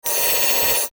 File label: sparkle zap; sound ideas